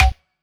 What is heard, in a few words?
Percussions